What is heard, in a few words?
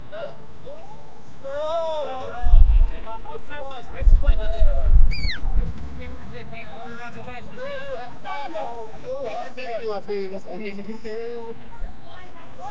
friends playing soccer, backyard